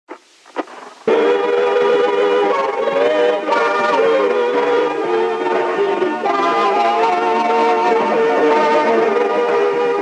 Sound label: Super slowed phonk